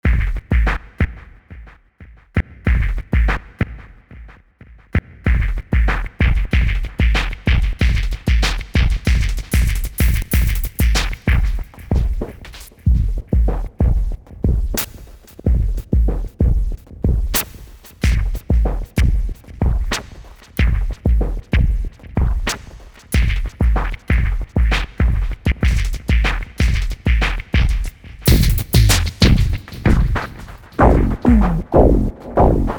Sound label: roland v-synth xt sounds, fm drumloop